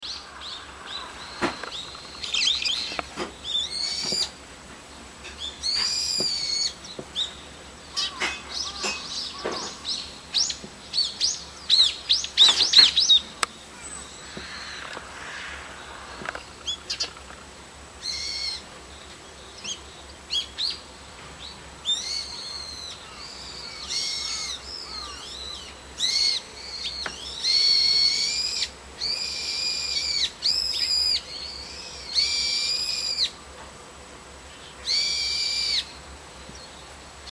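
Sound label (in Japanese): ヒヨドリ; とても声が大きく、うるさい位に騒いでいることもありますが、季節によって色々な鳴き方があります。; 日本の固有種; 日本の固有種で、海外のバードウォッチャーが日本を訪れた際は是非目にしたい野鳥の一つだそうです。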